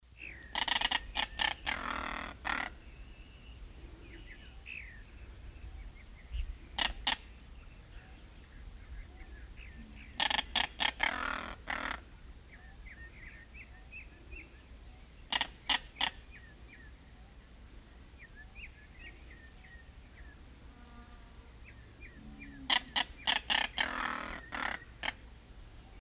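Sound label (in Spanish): caracara crestada norteño caracara cheriway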